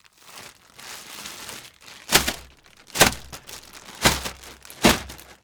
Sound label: plastic bag; best service Blue Box; mixed sounds short